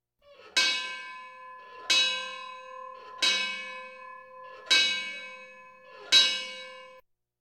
Police, Fire engines

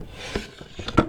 Door Display Case Slide Open; Doors

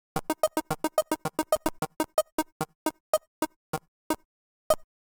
DICE Electronic B, Roll; Samples; ArticulatedSounds; DICE Sfx Party (Extended)

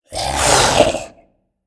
Zomby Stressed; Blind Survivor

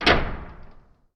DOOR Sci-Fi Heavy Close; mono; Universal Sound FX; DOORS & GATES

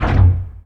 Hit Locked Metal Door Rattle; Doors

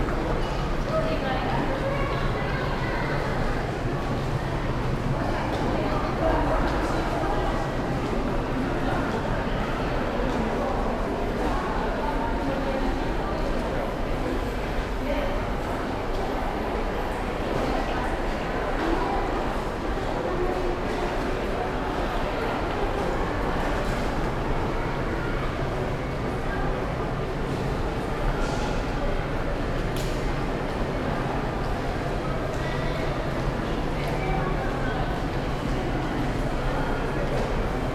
Urban people babble train station busy; urban and suburban; boom library

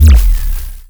sci-fi electric pulse hum; Space Wave Race

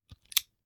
Hand Gun Foley; Sound Ideas; Guns

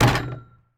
Street Ball Glass Back; SFX Kit; Street Basketball

